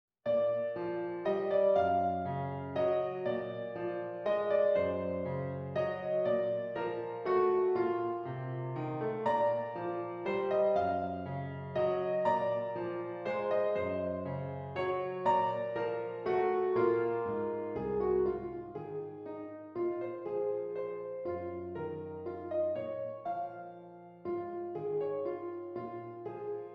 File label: Waltz; Free and Easy Waltz